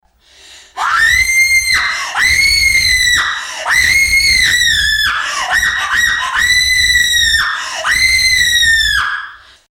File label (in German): Schreie